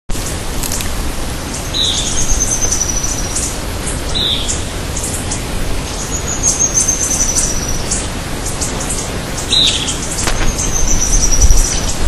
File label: Lathrotriccus euleri; Portal de Piedra; Jorge La Grotteria